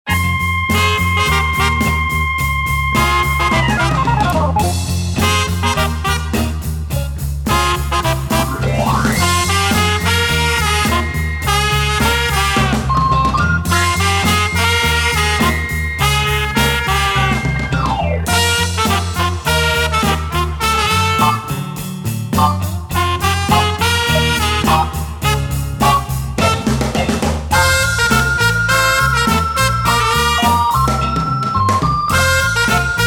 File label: party music